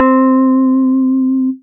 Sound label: Jazzy Geetar, Synth Keys; Instruments; Ableton; Synth Essentials; Operator